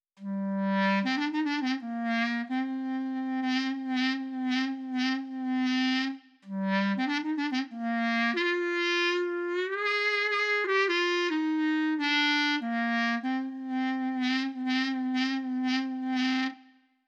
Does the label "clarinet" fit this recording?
Yes